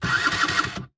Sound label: scooter false start; soundbits-soundfx